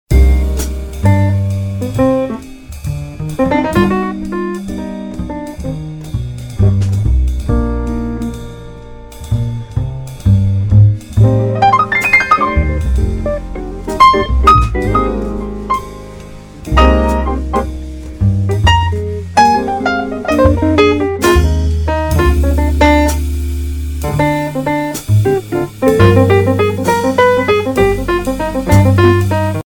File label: Jazz Quartet; Samsung SGH-i637 Jack